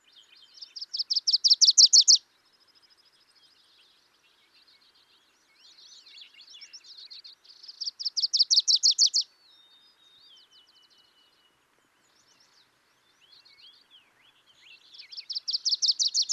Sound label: Wilsons Warbler Cardellina pusilla